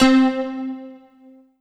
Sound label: Yamaha TG77 Dulcimer C4